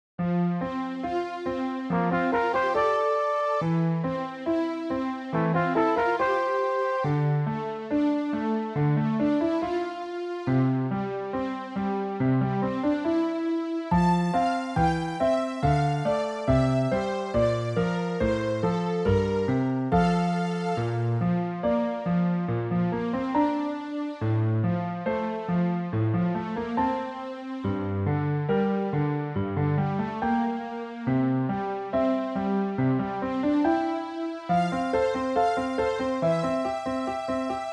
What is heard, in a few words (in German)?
F-Dur Variante mit Streicher